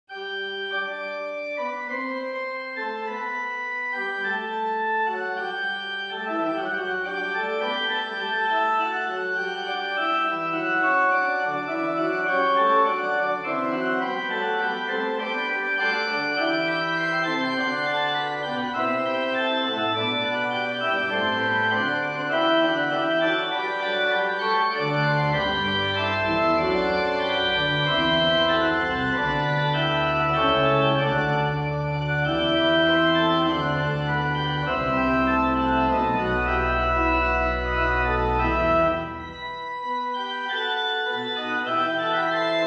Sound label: Fugue in G Major; Bach; Little Prelude and Fugue in G Major BWV 557